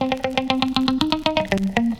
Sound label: guitar, phonky loops